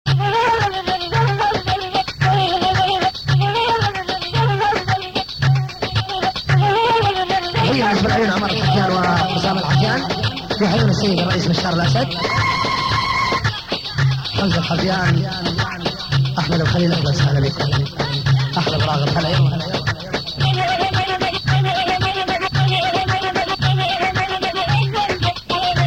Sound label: Arabic Dabke